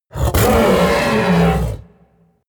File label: coloss scream deep; kreaturen